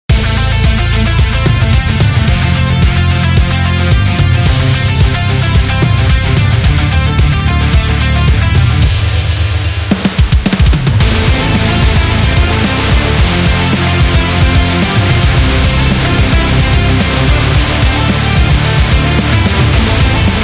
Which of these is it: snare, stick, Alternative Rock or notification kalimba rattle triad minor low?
Alternative Rock